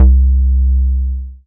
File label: Single Electric Basses, Dance Floor Bass